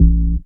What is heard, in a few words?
DR. LOOP, BASS; X-Static Goldmine 3